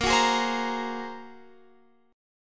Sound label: Bb 7th Suspended 2nd Sharp 5th Bb down Guitar Open F; Bb7sus2#5 strummed